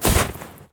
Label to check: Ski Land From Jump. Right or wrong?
Right